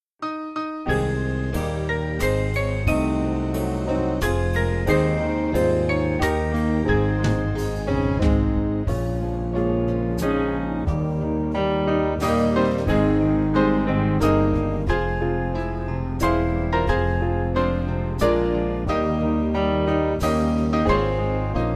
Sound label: Band; National Anthem